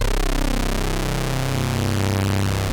POLY SYNTH; Wizoo - Powered Wave

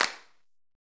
PRC XCLAP, Hand Claps; Club-50 - Foundations Roland; Snaps

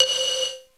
xylo gate, D-50; Greytsounds

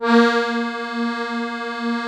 STEIRISCHE, A#2

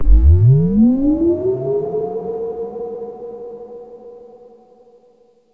SYNTH FX

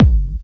hardbassdrumz, breakcore; Bazzdrumz

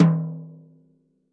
rack tom - snares off; JazzFunkKit

